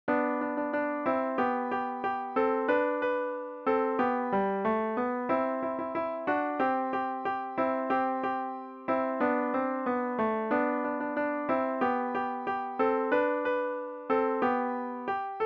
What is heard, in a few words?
piano